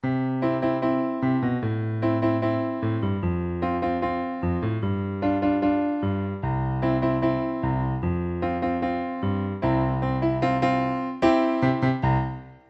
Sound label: 50s Jukebox